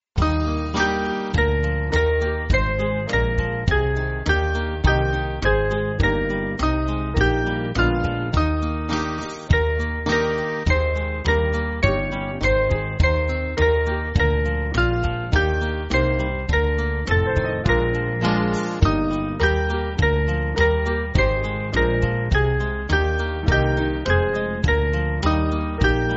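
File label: Band, Backing Files for Churches; Small Band; 3/Eb-E; Mannheim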